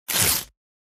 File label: Tear Cloth; Gameplay